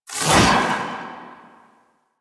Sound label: Sword Whoosh; Doom; Warframe; Warframes; Excalibur